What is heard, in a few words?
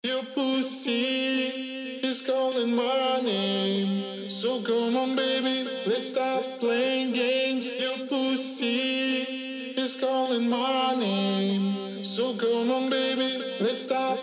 Acapella